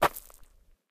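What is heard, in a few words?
gravel, steps; Terrarum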